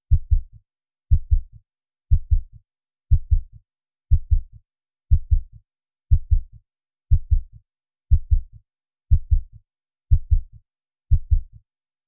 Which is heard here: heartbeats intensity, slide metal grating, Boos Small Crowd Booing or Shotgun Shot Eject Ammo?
heartbeats intensity